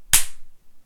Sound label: slap; William Dyer Sound Effects Library